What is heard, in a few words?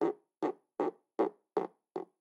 Insect Weird; Physicist